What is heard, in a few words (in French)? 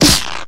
Impact de coup sec; MOBICLIC 14; courrier